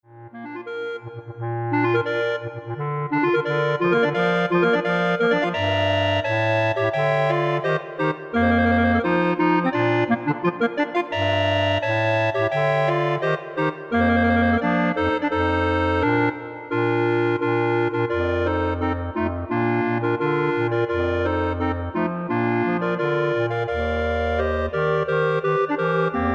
Clarinet Ensemble

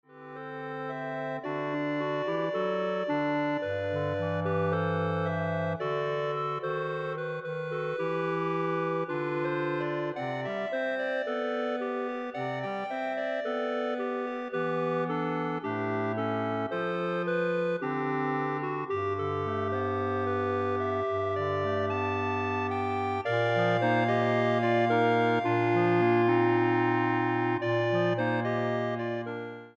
Clarinet Ensemble

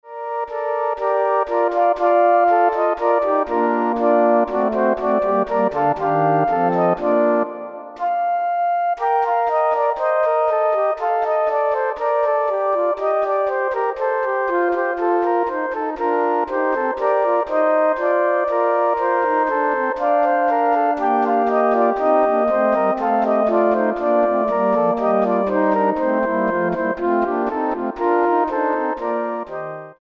Flute Ensemble

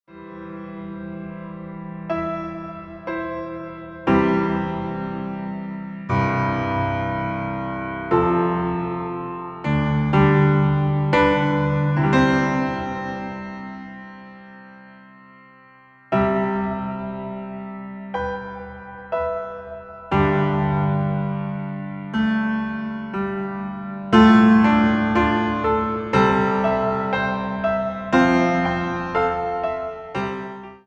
Piano Solo

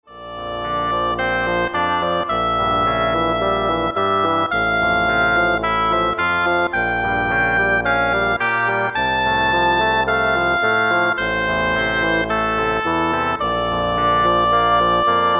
Double Reed Ensemble

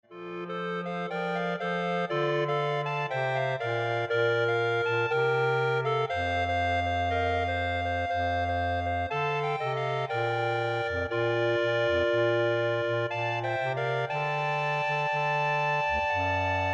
Clarinet Ensemble

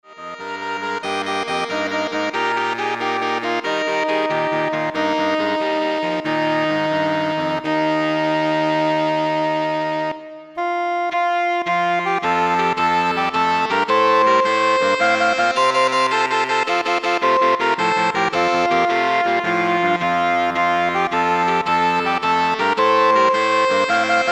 Saxophone Ensemble

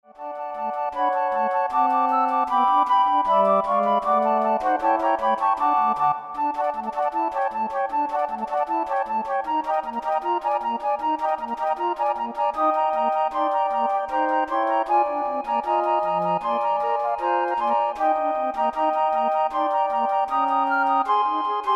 Flute Ensemble

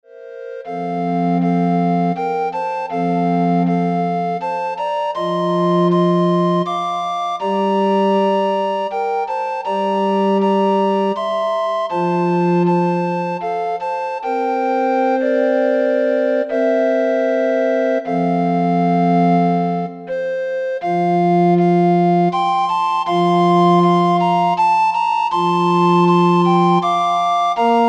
Recorder Ensemble

